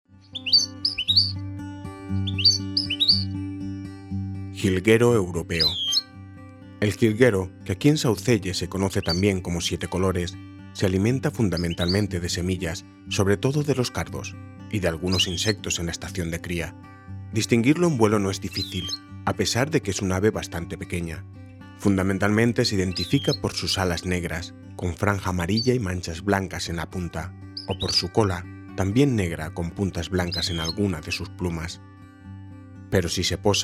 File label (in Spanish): jilguero; aves; lavanderas